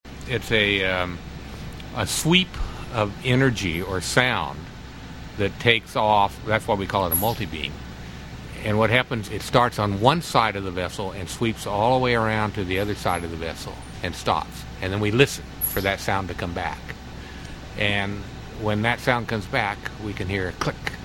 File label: sonar sound